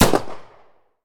Firearm Handgun B Fs92 9mm Fire Short Reverb Tail Stereo; Smashy Road Wanted 2 android ios switch gamerip 2020